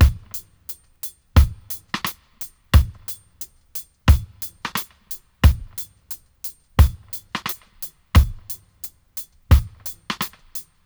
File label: 88 dry, drum loops, dub; Drumdrops In Dub VOL-1